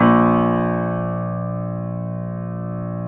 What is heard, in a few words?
A#0 L, Keyboards Acoustic; USB Soundscan; AKAI